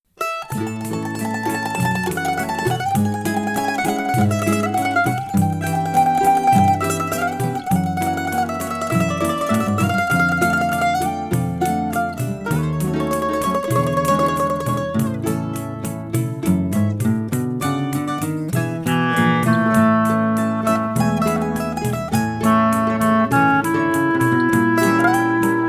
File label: songbook instrumental